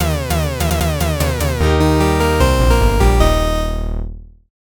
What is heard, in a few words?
Round End; A Sound Plan